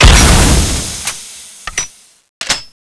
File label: cannon ice shoot, weapons